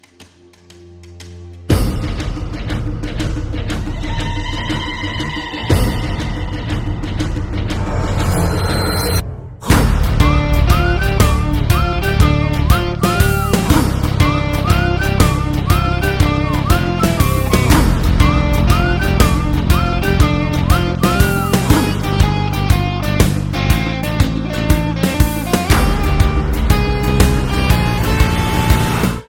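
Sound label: background music ringtone; RDX THEME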